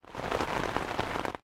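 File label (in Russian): шум дождя попадающего на раскрытый зонт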